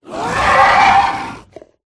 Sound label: riding attack voice up; krofdor